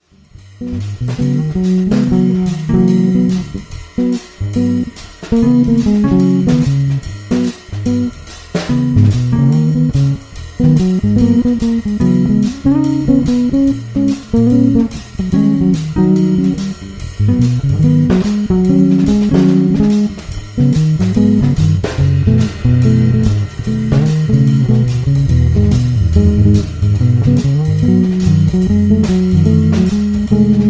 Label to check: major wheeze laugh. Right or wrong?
Wrong